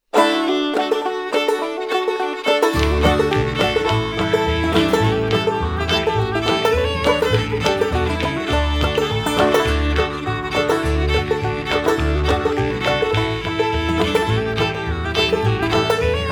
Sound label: banjo